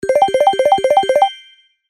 cash out long

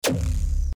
laser hit; ThreeHeadedDragon

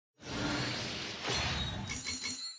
reveal 5 star; genshin impact

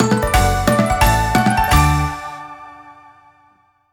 large Win; fruit shop

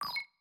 bomb detect

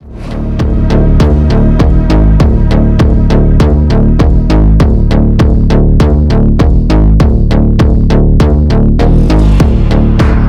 music dramatic

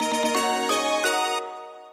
win

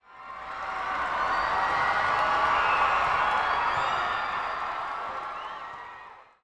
crowd Reaction arena small Reveal; spellbound

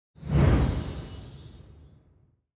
PORTAL ENTRANCE

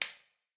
spank; spellbound

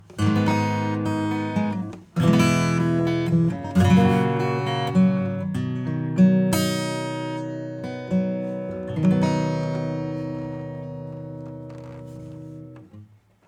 acoustic bypass

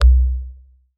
ui teleport menu back

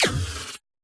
Shield Impact

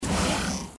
lightning bomb; ThreeHeadedDragon

